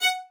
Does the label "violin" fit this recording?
Yes